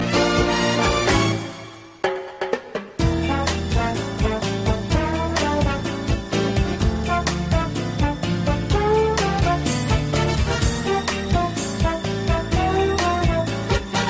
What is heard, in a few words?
tango